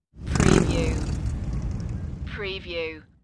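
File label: Motorcycle Rev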